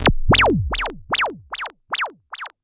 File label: SH-09 Delay